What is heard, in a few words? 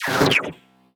Retro FX; Cymatics; Video Game Sample Pack Vol 1